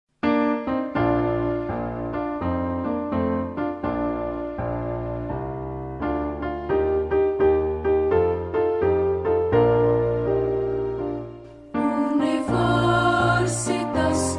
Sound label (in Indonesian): Versi Instrumen; Hymne Universitas Kanjuruhan Malang